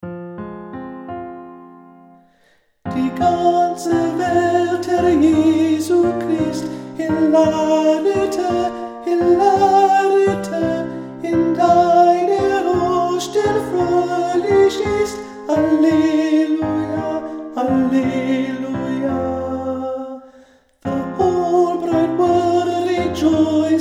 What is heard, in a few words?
Hilariter Alto; st barts